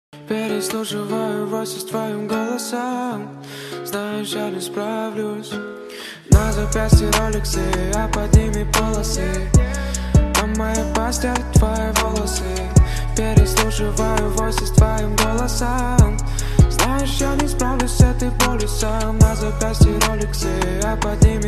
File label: guitar cover